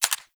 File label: SMG Mech D; Tenno; Sundial